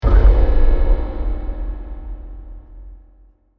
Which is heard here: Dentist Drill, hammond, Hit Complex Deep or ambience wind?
Hit Complex Deep